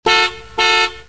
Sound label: Beep beep